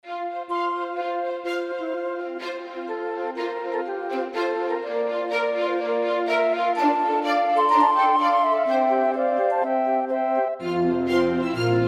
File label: Mini Concerto piano